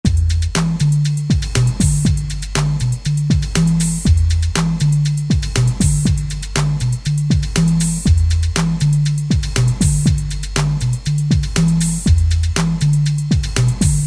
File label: Funk